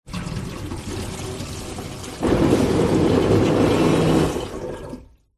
household metal kitchen sink plug pull shallow water drain plughole under sink; pump operate